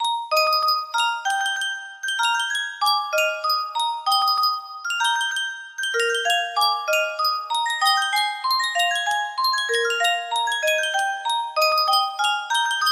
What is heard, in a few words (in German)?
Yunsheng Spieluhr